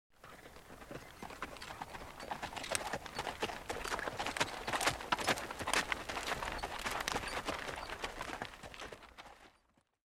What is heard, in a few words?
Horse Drive By; cinematics; Age of Empires III - Complete Collection; Microsoft Studios